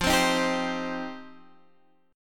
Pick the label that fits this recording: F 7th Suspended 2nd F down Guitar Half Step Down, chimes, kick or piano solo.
F 7th Suspended 2nd F down Guitar Half Step Down